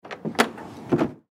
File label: Diaper Changing Station Close Push Up; Public Bathroom; INDUSTRY; Blastwave